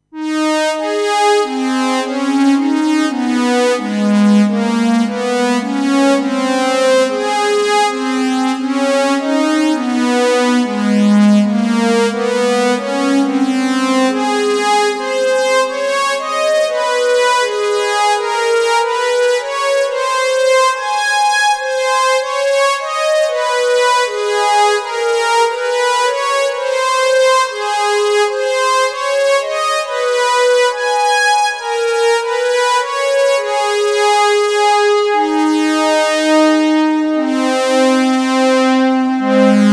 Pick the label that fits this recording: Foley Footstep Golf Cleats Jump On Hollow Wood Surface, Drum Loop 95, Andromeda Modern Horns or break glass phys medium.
Andromeda Modern Horns